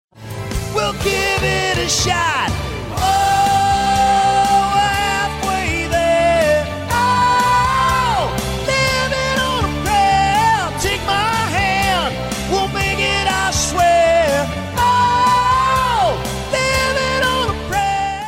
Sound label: Sings